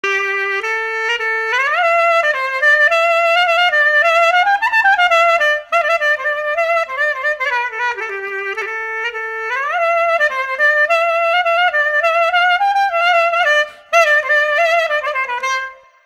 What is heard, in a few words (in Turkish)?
Klarnet zil sesi